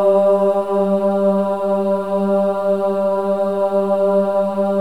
OH G3, WOMEN OHS; Spectrasonics

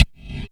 percussion, Severe Gate Kit; Dance Synths

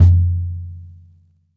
bop kick - snares on; JazzFunkKit

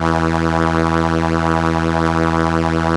ARP E1, STRENS; Sonic Reality; Vintage Timetraveler

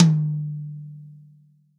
TOM, Real Drum Kit, POP KIT

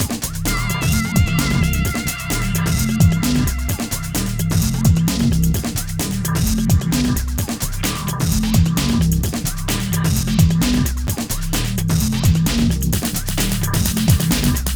Hardcore Breakbeat, 130 Cmin; Big Fish Audio; Hardcore Breakbeat Action; Gotham

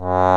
ACCORD, Accordions; E-MU Formula 4000 Series Vol. 4; Earth Tones

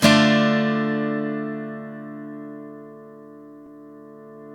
GTR D35, Chords; Club-50 - Foundations Roland

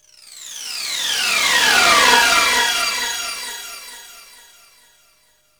FLOATING; Q Up Arts; Dream Experience; SF EFFECTS

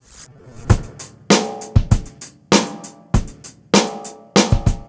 beat, roots funk; USB Soundscan vol.41 - Roots Funk; AKAI

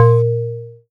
BELL-GONG, A#; Big Fish Audio

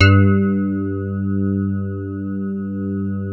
DIGI RHODES; Sound Gallery - Charged

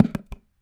Thunder Drum

